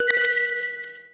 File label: Pickup Magic Bells; Pickups